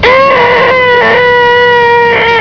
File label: attack, houndeye; svencoop; cracklife